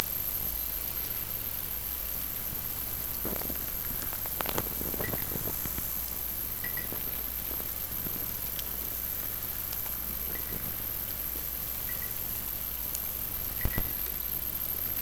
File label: Hydrophones, orcasound